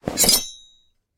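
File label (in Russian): звон двух сражающихся сабель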